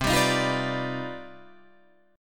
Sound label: C Minor Major 11th C down Guitar Drop D